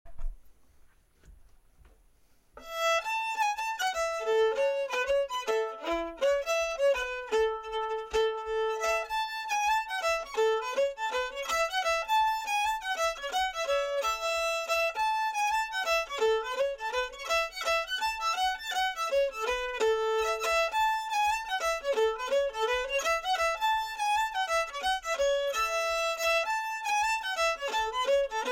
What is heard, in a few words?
Ragtime